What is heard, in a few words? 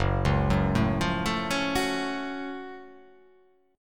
F sharp Minor Major 7th F sharp